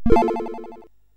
Game Effects, sid CSG8580R5; Morton Adam

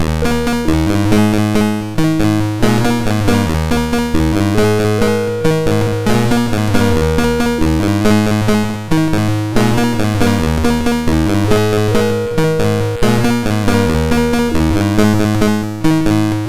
Randomly generated music BASIC, sid MOS6581R2

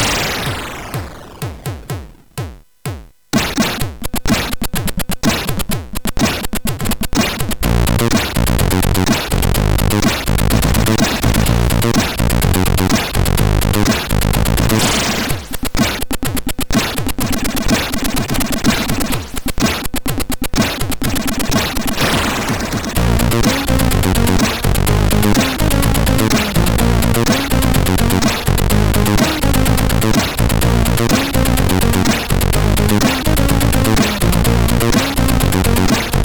Magic Funk CSG8580R5; sid

